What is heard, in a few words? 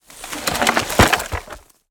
wood handling; sonniss gdc